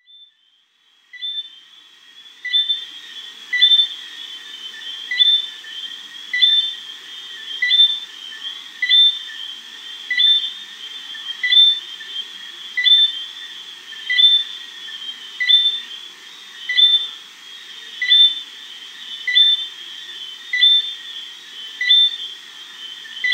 Eleutherodactylus johnstonei

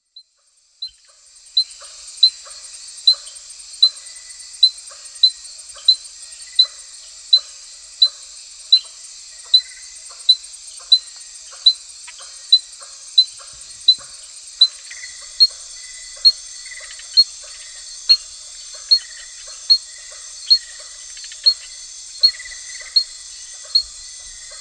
Eleutherodactylus sp.3

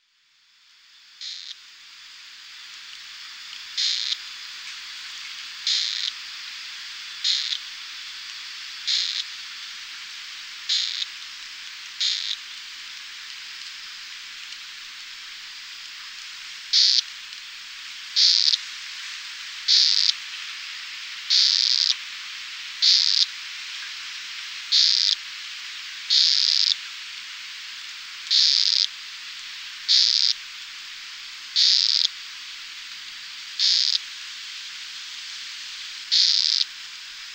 Dendrobates ventrimaculatus

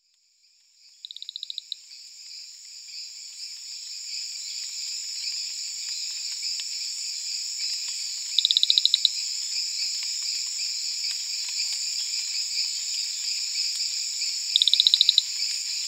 Eleutherodactylus marmoratus

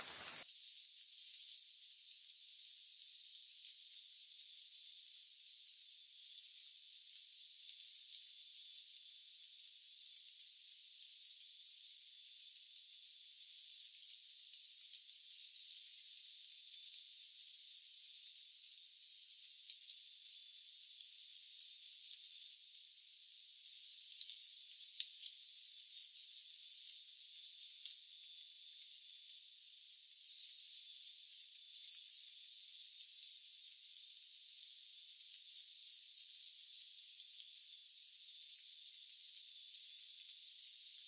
Hyalinobatrachium iaspidiense; guyane

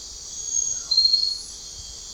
Hyalinobatrachium taylori; guyane

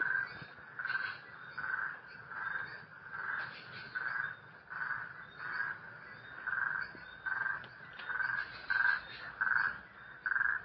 Rhinella margaritifera; guyane

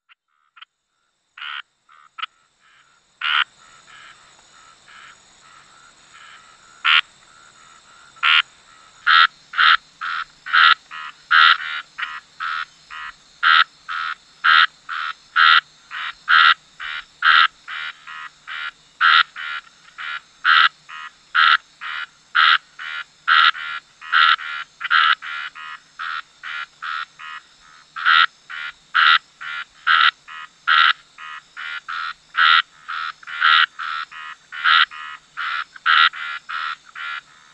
Scinax ruber

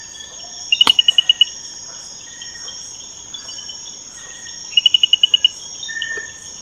Pristimantis espedeus; guyane

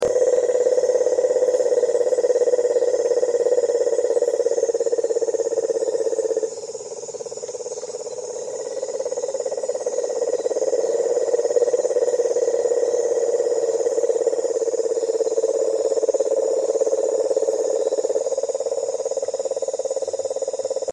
Rhinella marina; guyane

